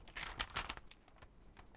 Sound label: Paper; Civ4 warlords